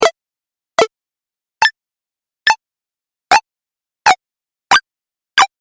Videogame Variety Pack; Video Game Sound Effects

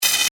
Static Buzz, Production, Element, Static, Buzz; Sound Design; BlastWave

